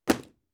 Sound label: book drop